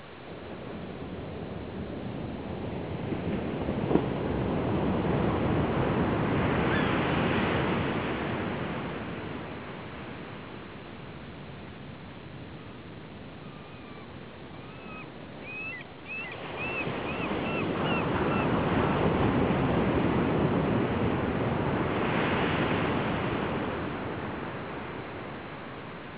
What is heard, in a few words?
waves